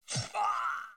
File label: hit groan; angband